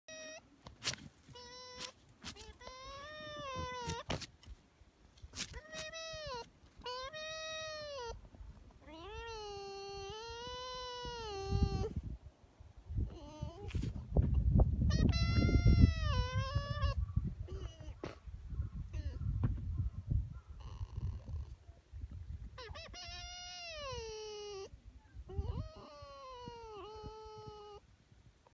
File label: fox noises